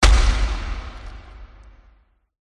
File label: angel attack contact up